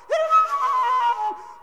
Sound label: flute voice; SDIF; ICMC2000